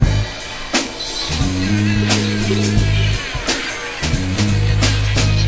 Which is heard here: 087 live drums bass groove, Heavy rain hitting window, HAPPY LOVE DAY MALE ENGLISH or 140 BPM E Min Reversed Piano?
087 live drums bass groove